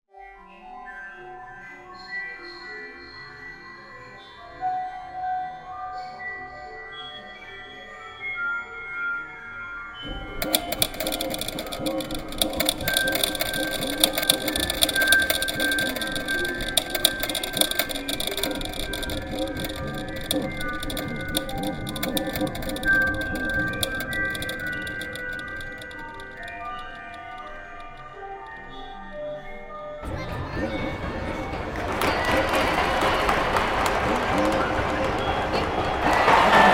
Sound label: soundscape; which one is mine